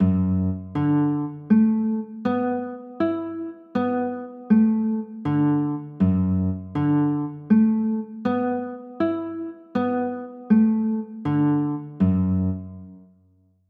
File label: d 6 9